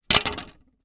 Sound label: plastic phone cover down on surface; foley; zapsplat